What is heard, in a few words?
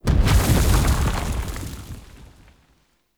Heavy Melee Charge Impact